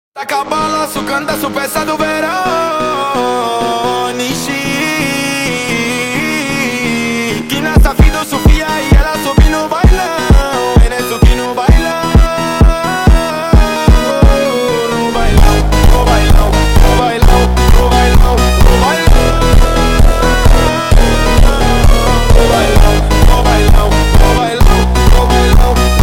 Phonk

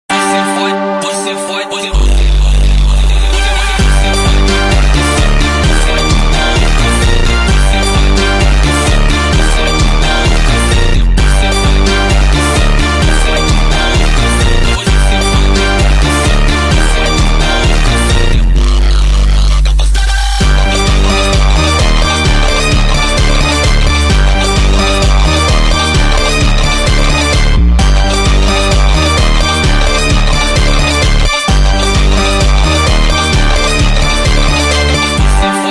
Phonk Montagem

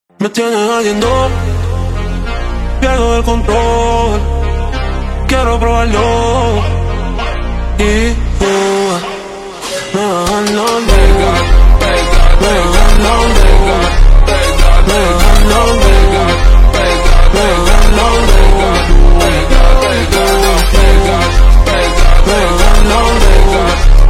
Phonk, Super Slowed

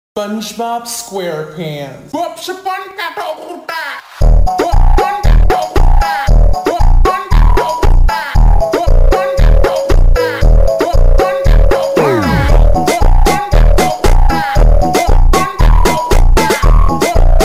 Phonk Slowed

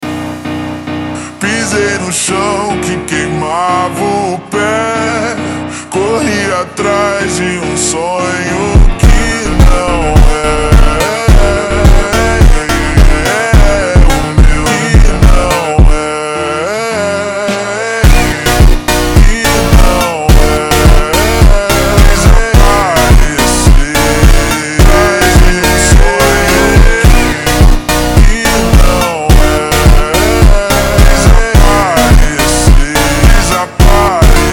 Phonk, Ultra Slowed

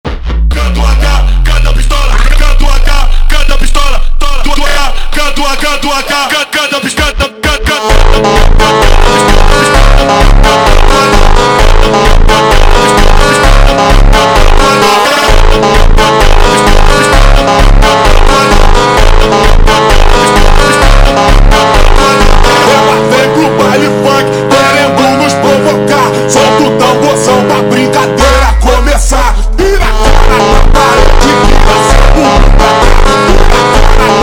Phonk MONTAGEM

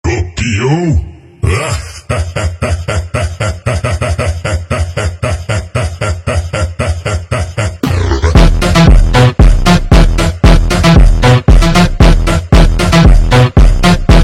Phonk

